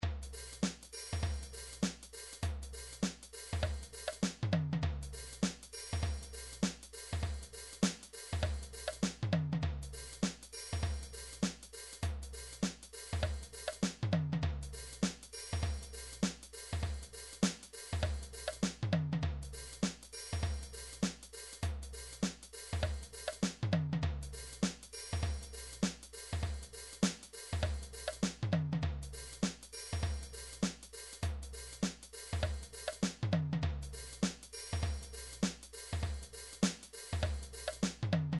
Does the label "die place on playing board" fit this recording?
No